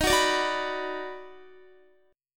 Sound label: Eb Minor Major 7th Sharp 5th Eb down Guitar, chord; EbmM7#5; strummed; JGuitar